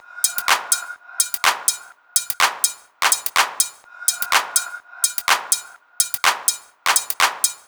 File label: 125BPM TOP LOOP